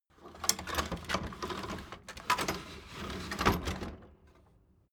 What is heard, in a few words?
door flap loft ladder retract